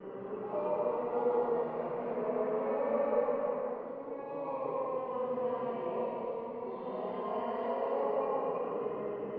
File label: MOANING, poltergeist